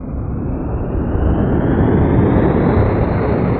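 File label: vehicle jetpack accel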